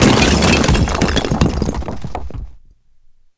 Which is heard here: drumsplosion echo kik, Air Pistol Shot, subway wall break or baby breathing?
subway wall break